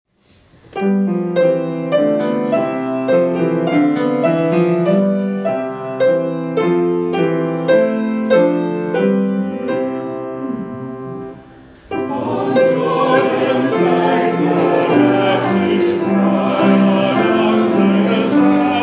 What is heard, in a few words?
Hymn; On Jordan's Banks the Baptist's Cry